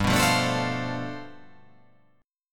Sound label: G 11th